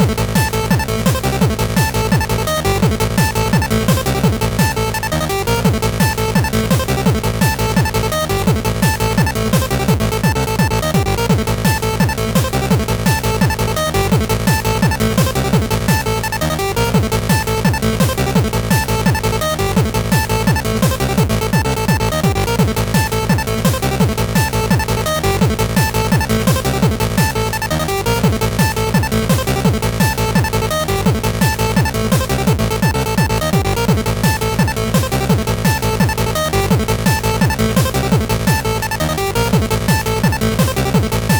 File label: Underground Burial Site Haniwa Event (cutable 8bit Hitech PsyTrance)